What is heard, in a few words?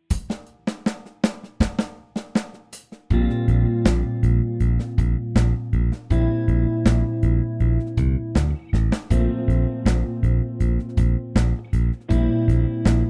lick backing